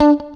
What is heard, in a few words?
STRAT, GUITARS; Synclavier Sampler Library